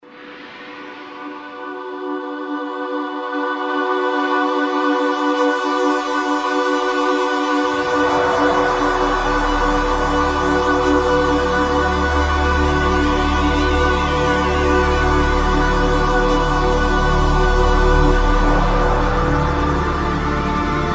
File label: pad rich, yamaha sy99; synth